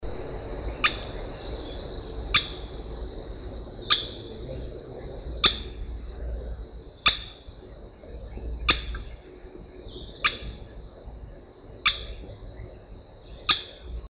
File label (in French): autour tachiro, chant